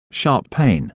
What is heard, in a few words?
Sharp Pain